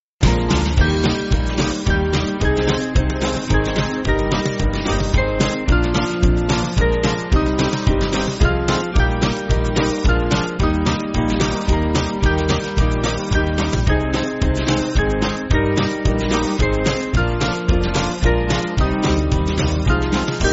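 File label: Music Backing Files for Churches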